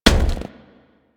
Crewman Footstep Walk; Warframe; Corpus

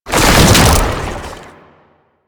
Run Start Side, Foley; Enemies; Warframe; Proto Infested; Babau

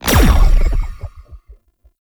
Alt Shot Fire, Weapons; Warframe; Cedo Prime

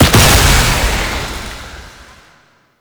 Void Fissure Close; Warframe; Entrati; Conquest

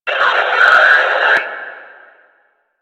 Death, Enemy, Barks, Battle; Warframe; Lasrian; Lasrian Enemy C

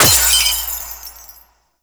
Infection Destroy; Warframe; Cephalon Weave

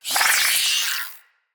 Root Spider Elite Vocal Pain, spider; Animals; Spiders; Vocals

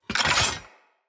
Sphere Sword And Board Equip; Weapons; Swords

